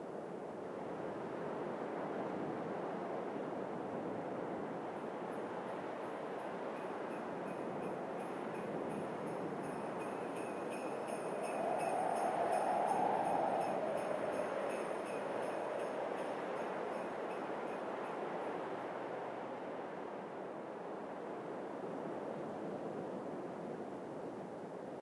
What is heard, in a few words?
Christmas Ambience; Angry Birds Seasons